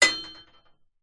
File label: impacts metal hollow frame hit sharp strike rattle